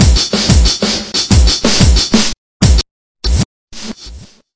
skystep, DRUM LOOPS and BREAKS, 183 bpm

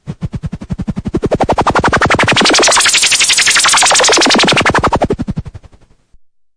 noise MiniBrute, Noise Sweeps

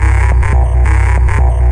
140 hexbeat, drum loops and breaks

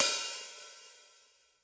HH ACOUSTIC OPEN INNER, Roland TD 7; DRUM MACHINES

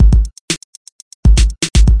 120 electrobreaker, DRUM LOOPS and BREAKS

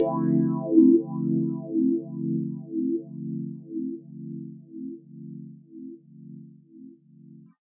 wobbly swell chord D, piano; Piano and Epiano

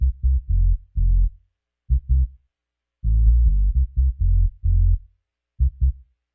lowflow italo bass

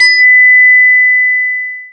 ringing glass bell; Weird and Interesting Hits; single hits

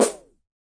snappin bap; Hip Hop Specialty Kit; STYLE KITS (Hip Hop, Etc)

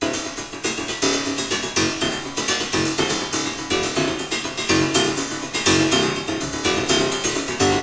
123 prepared piano hammering; KEYS ORGAN PIANO LOOPS

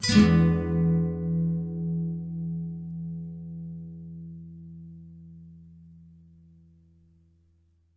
Nylon Chord E7; Nylon Guitar Chords

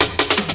groov up intro, DRUM LOOPS and BREAKS; FILLS and ROLLS

